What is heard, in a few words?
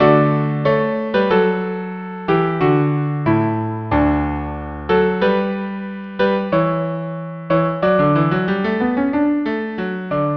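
violin cello; joy to the world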